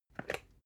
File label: water boiler pick up